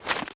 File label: ui pickup item